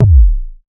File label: boom bass drum one shot e key; kick drum samples